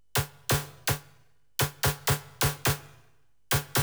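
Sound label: percussion, house, 125, drum; eJay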